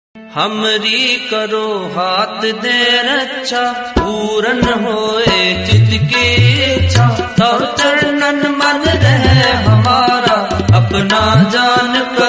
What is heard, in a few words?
punjabi dharmik